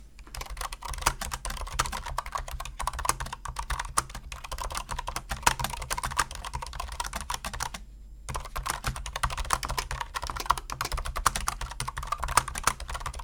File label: soundtest razer deathstalker v2 pro